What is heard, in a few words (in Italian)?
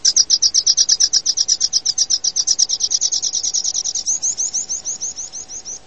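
Spioncello Anthus spinoletta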